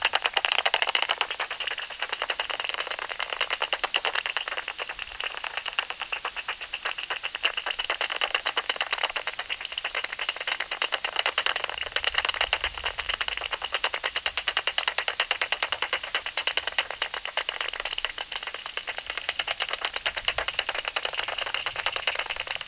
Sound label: bat calls